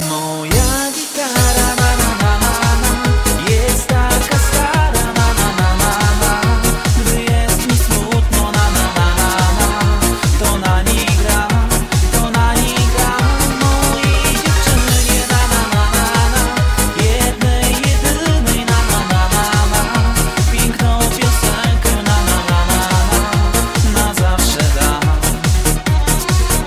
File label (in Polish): Gitara